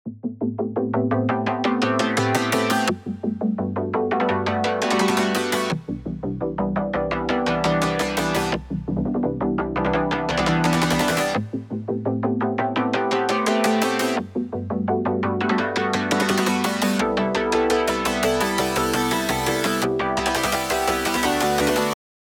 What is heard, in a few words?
chord stab